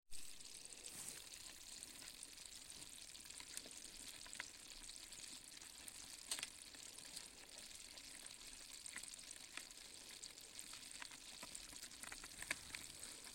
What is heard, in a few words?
fishing crayfish